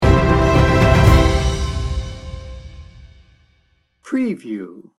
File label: flying high sting bumper